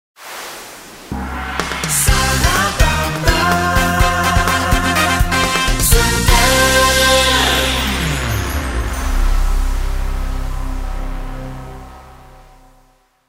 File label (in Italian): Sigla; SuperSarabanda